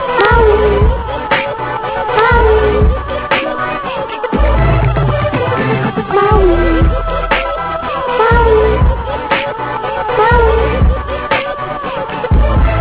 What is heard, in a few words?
ringtone; my wii ringtone